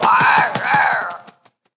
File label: Messenger Raven Attack